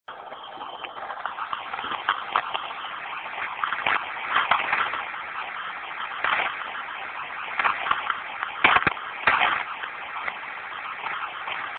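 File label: rustle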